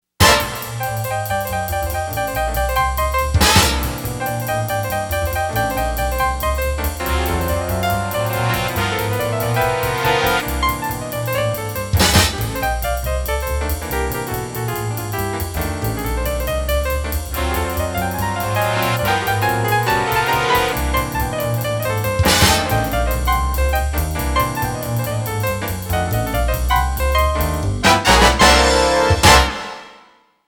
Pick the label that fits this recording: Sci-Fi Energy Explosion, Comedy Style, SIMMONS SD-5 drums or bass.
Comedy Style